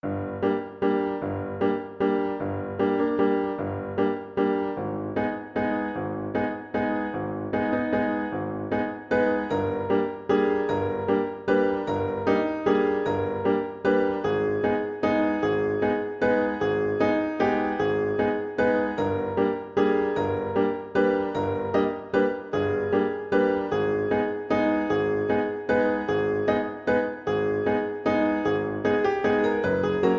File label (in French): Valse des monstres autour du feu